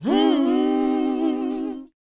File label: WHOA A#, Vocal R&B & Dance; ILIO; Vocal Planet